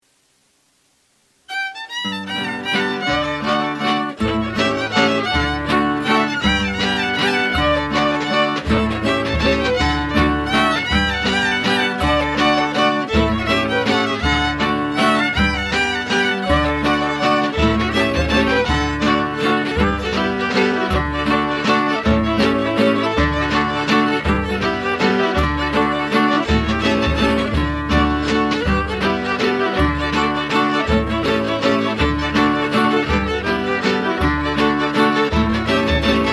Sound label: Mazurka, fiddle tunes